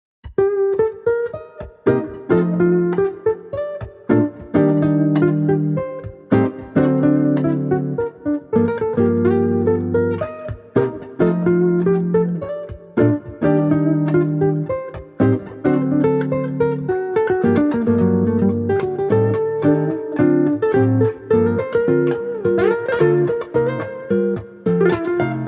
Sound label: Gypsy Jazz Style Guitar